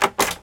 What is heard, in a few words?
Unlock; Hamsterball